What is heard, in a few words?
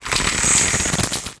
extra electrics emerge